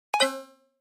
Flash Beep Guitar

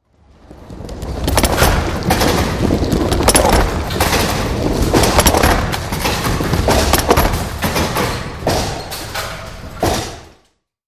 Bowling Ball Roll Fr, bowling

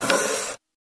Door Outer Close Dam, dat machine

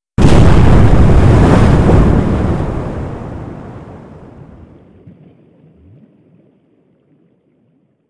Underwater Explo, explosions

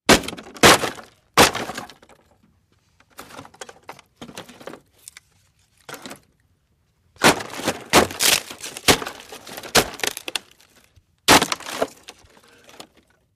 Bash A Wood Box

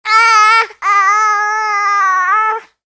Baby Grumpy Whine, cries

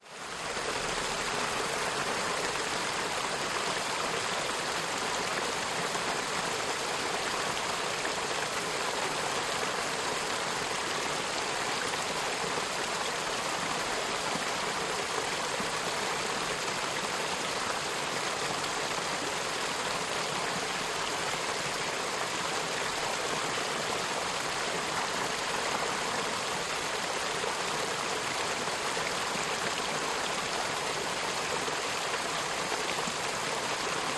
Small Rushing Stream

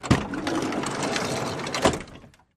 Dodge Van Slide Door O

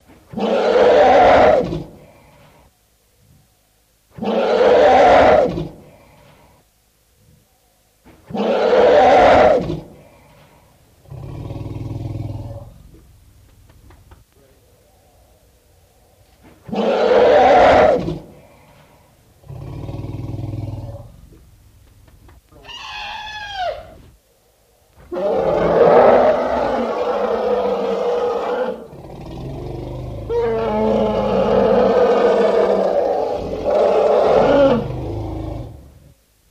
Elephant Roars, elephants

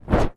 Torch Movement; torches

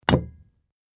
Beer Mug Thump Clinks; mug drinking

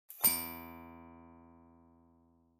Spring Thin Sci Fi, buttons & beeps

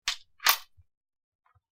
Standard Bullet Load

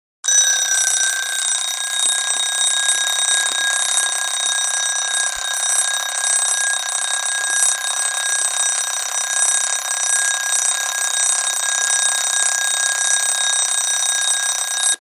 Alarm Clock Ringing; clock chimes rings

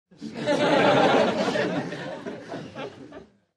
Crowd Short Laughter, medium

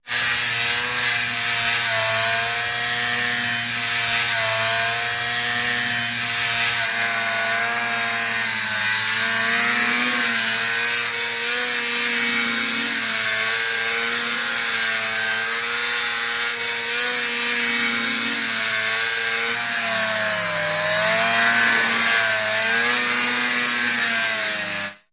Snowmobile Steady

